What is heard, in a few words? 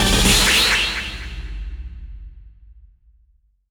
revenge jingle; Initial D Arcade Stage Zero